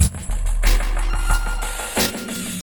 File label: BACKBT, HipHop; LiveAct Pool